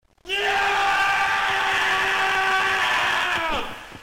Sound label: screaming carrot; peak